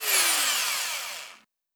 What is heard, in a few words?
fireworks reload wave end; weapons